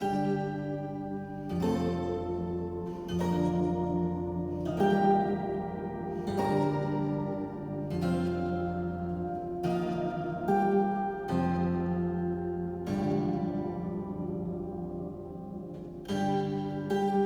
Lute; Bard's Countercharm

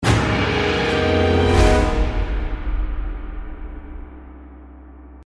Defeat

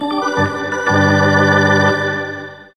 Organ; nhl faceoff 98 ps1 gamerip 1997

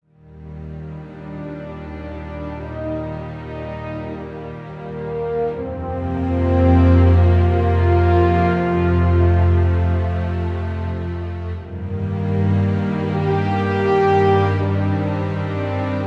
Stinger Swell Happy Resolve; Global Conversation 28

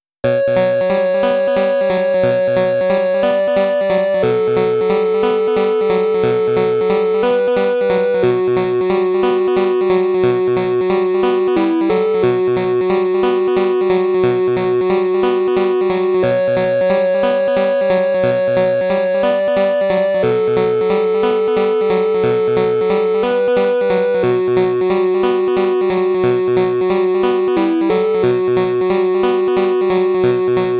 Dungeon; Ninja JaJaMaru Collection Original Soundtrack

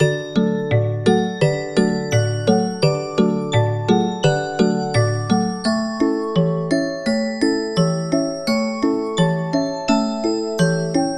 Christmas Market; peppa pig world adventures

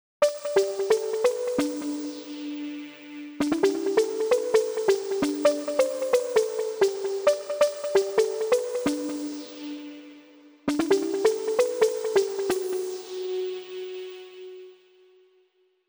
Poppin Piano; super crush k.o.

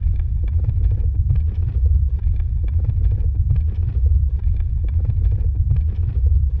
Heavy Roller Long; Chronovolt

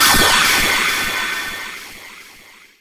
Explode; dark witch music episode rudymical

